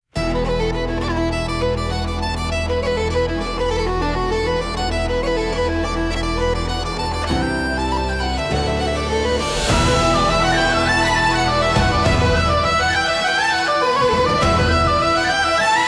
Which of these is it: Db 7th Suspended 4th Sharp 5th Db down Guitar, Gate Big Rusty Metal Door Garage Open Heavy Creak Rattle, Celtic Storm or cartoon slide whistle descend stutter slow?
Celtic Storm